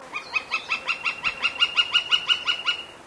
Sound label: Gilded Flicker